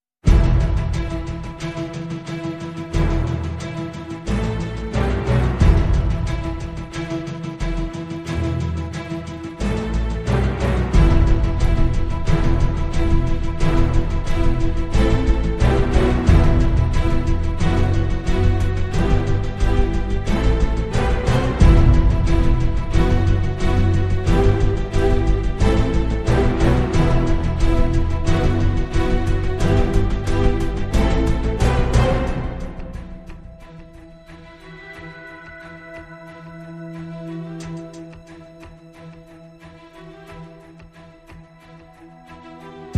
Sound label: Full With Small Perc